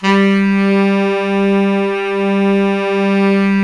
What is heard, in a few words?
SAX, Tenors; Giga Samples Collection